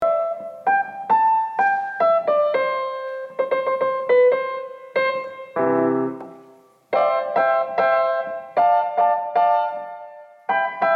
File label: steinway